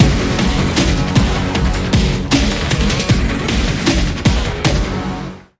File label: Creeps and Creaks, Sting